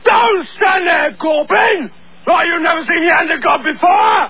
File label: gawk